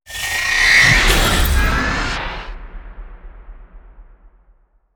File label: flow hit complex long; Ninja Tracks